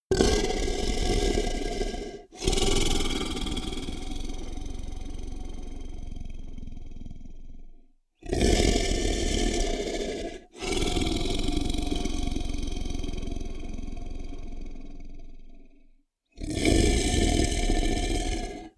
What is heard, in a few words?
monster snore vibrate; comedy-cartoon; koerpergeraeusche